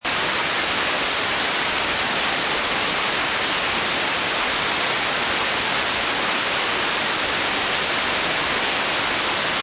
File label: Weather Rain On Lake Loop, An even, heavy rainfall, rain falls on lake or other water surface; Seamless loop